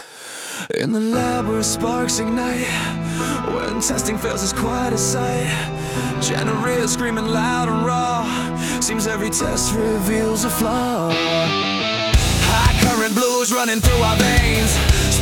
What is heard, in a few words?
nu metal; English